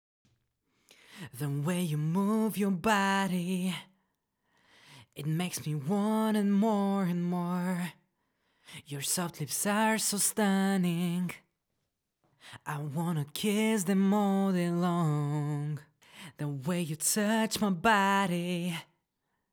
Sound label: Pleasure vocal